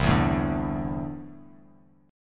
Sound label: C 6th Add 9th C down; C6add9 strummed